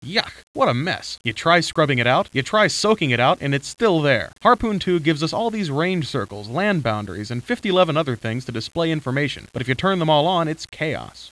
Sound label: HARP